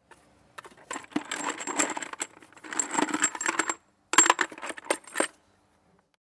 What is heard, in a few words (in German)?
Schrauben